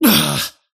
parry fail; dota; kez

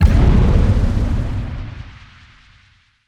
damage summary; weapons; dota; earthshaker

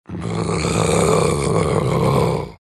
growl; bloodseeker

